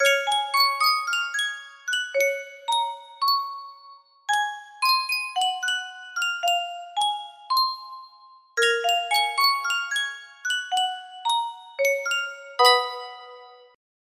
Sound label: Sankyo music box, music box melody